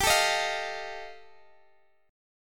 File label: G 7th Suspended 2nd Sharp 5th G chord, Guitar Standard; strummed; JGuitar